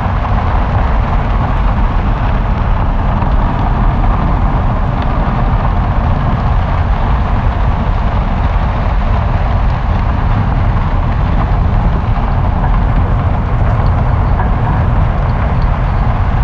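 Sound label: Exterior Well Revolve Loop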